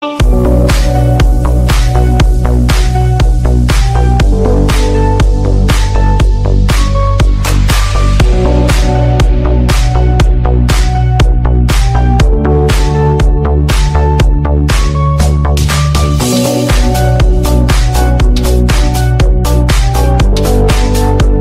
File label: Friendship Remix Best Dance